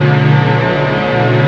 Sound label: DREAM PAD; E-MU Formula 4000 Series Vol. 1; Hip Hop Nation